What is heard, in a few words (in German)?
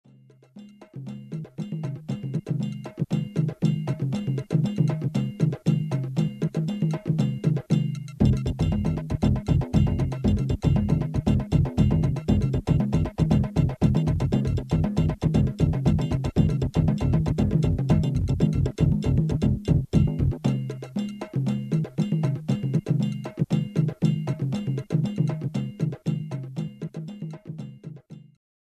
djembe